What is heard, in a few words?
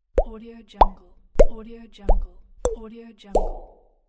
bubble pop